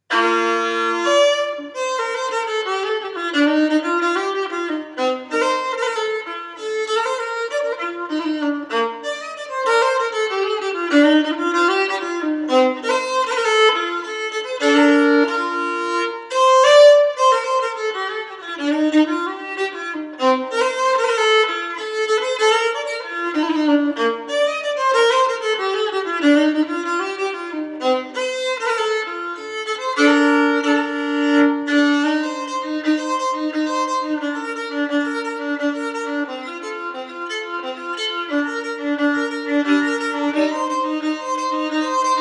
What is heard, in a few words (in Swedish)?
Polska; Jultomtarnas